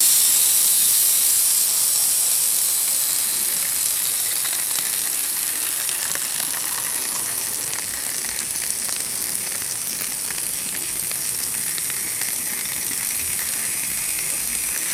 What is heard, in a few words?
continuous sizzling of frying food